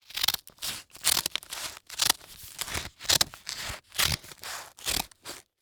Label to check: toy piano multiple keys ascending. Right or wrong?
Wrong